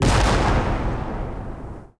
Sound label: explosion